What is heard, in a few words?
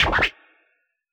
SCR, Yamaha DJX, B900 Kit